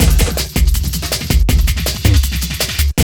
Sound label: JUNGL 160, JUNGLE LOOP